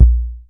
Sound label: DR KICK; E-MU Producer Series Vol. 2; More Studio Essentials; Composer